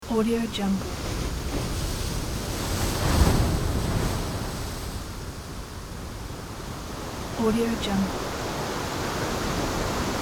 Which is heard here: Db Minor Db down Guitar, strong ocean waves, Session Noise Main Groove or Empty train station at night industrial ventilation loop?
strong ocean waves